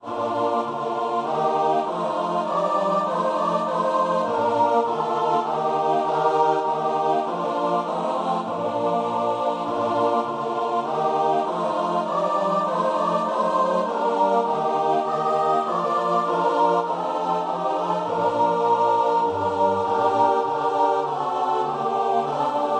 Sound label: choir; love divine